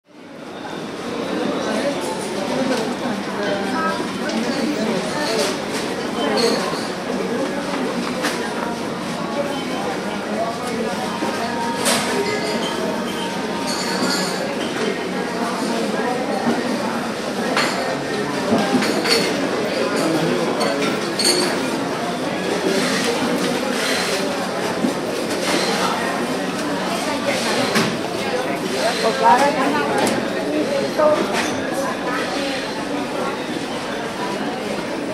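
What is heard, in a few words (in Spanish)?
Ambiente Cafetería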